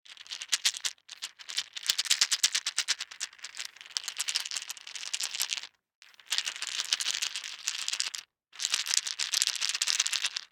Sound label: DICE in One Hand, Shake, 16 Sixteen Dice; Samples; ArticulatedSounds; DICE Sfx Party (Extended)